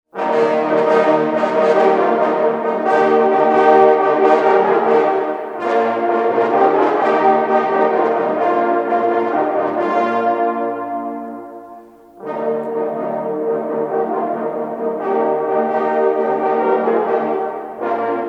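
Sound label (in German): Jagdhornbläser Hörprobe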